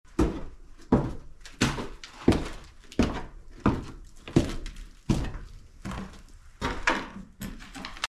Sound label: footsteps old wooden stairs house